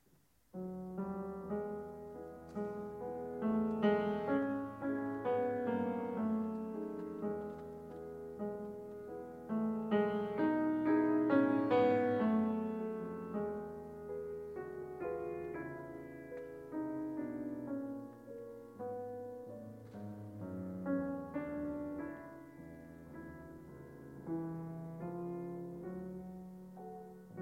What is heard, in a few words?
Piano Sonata in B-Flat Major, Andante Caloroso; Prokofiev; Piano Sonata No. 7; Op. 83 II.